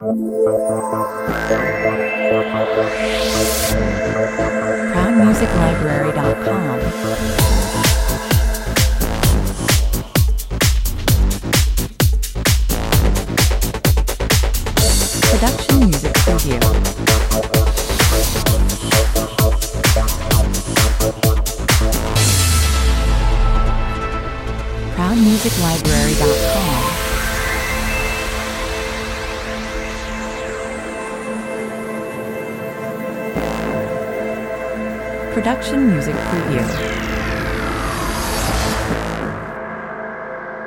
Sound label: 130 bpm